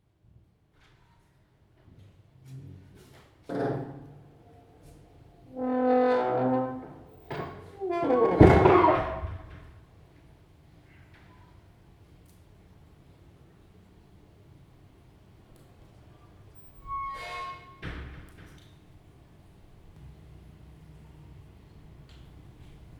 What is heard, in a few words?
Roncesvalles Church Bells Door